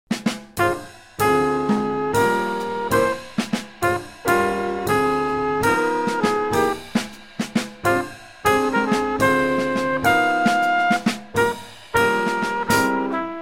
minus Bass; medium slow; count off sets up the melody; play figures/walk for the melody through D

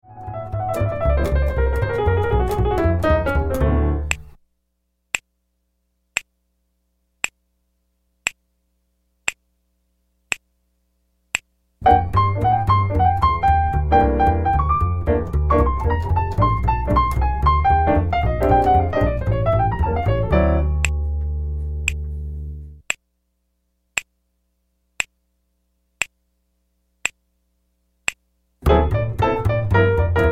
minus drums snaps; trade 8s with piano (piano first) 1 chorus; snaps have been added during trading

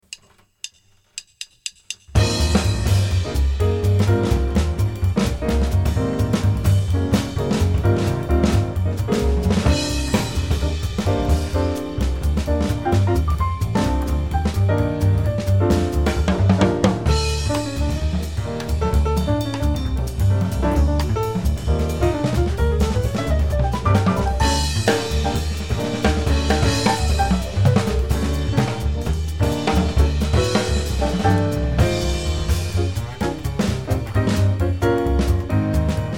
minus melody

